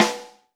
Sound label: snare, Zoom MRT-3B